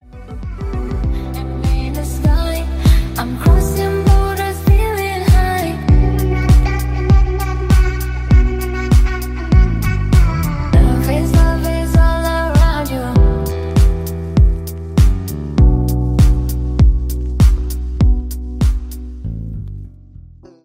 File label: sad ringtone